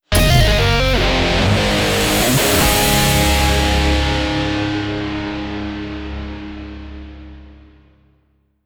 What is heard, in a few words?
Notifications Rank; MPL Notifications